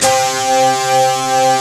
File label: HEAVY DUT, JV-1080; Syntec; Wall of Sounds